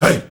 HEY HEY

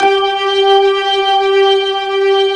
HAMM G4, Keyboards Old School; USB Soundscan vol.09; AKAI; BIG HAMM

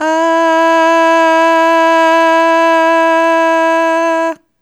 Aah Long E3 Dry; Harmonic Building Blocks

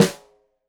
Snare Stereo, Drums; Deeply Sampled Drums; SMDrum

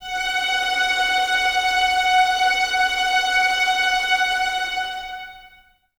Violins Tremolo F#5; 23 Violins; String Ensembles; Miroslav Vitous

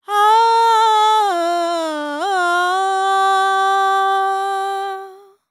World Voices, INDIA; ILIO; Vocal Planet

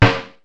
Snaredrum, Korg T3

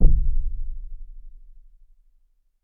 Tom F#3, Roland U220; drum machine

